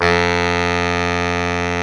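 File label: SAX Baritone Sax F 2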